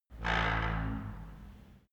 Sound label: wood door old creak